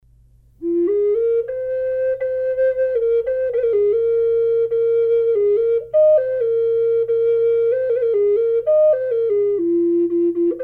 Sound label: alaskan yellow cedar burl timber wolf, flutes; collector flutes